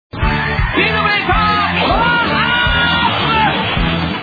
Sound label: speed breaker powerup